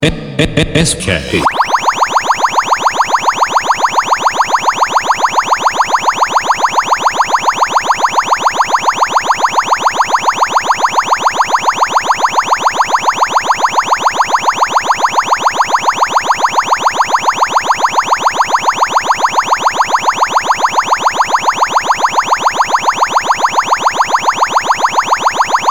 New Ghanti Competition Beat Remix

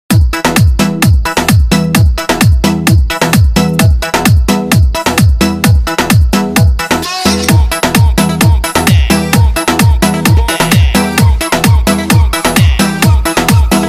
Hindi Remix Song

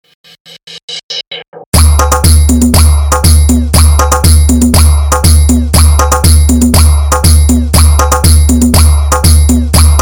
New Testing Rhythm Competition Beat Remix

